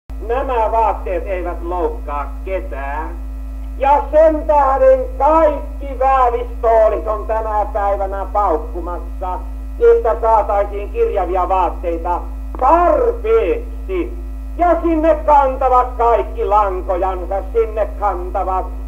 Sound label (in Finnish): Kelanauhan digitointi aaninayte kasittelematon